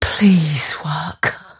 cast pain; dota; mirana

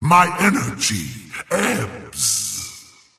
teleport pain; razor

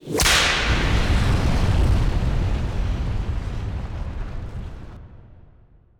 run whip; weapons; dota; queenofpain

